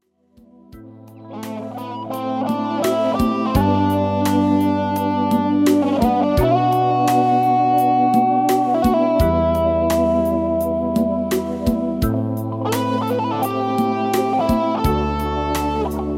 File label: Solo